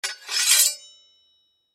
Sword Remove From Sheath Scrape Short; Warfare; Swords; Combat

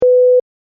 Censor Beep Very Short; Beep Sound Effects; electronic sound effect

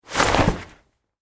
comedy flasher coat, Comedy Flasher Coat Open; Comedy / Cartoon Flasher Opening Coat; Cartoon Sound Design; McKinney Sound

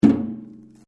RUBBER ON METAL HOLLOW; Materials

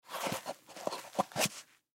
High Heel Put On Slow Single; Shoes; Foley

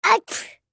Sneeze Male Two Year Old Boy; Sneezing; Human; Blastwave

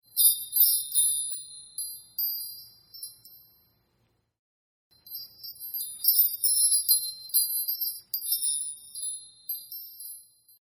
Windchime Playing; Windchimes; Musical

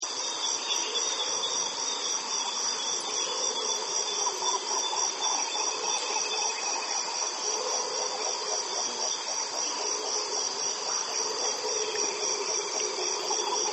Jungle Chorus Rhebus Monkeys; Nature Scenes; Animals; Partners In Rhyme

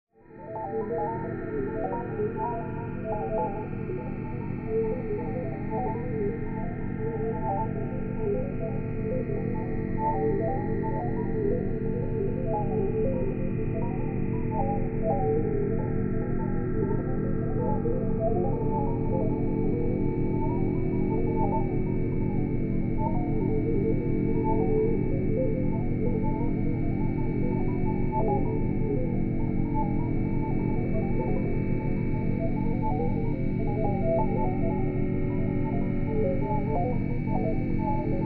Drone Data Hum Swell; 5.1 STEREO MIX; Blastwave

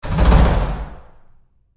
Door Bathroom Stall Handling Roomy Rough Short; Bathroom Sound Effects; Big Room Sound

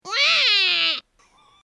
Pretend Baby Crying Sound Annoying Cry; Baby Sound Effects; human sound effect; Andrew Fleming

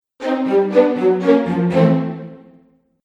Fail Strings; Musical; Musical Sound Effects

